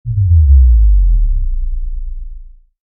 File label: Impact